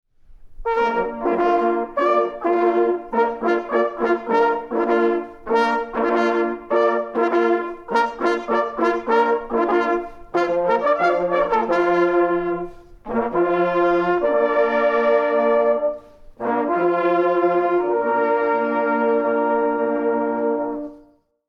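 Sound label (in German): Reh tot, Jagdhornbläser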